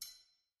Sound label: slider decrease down; lost relics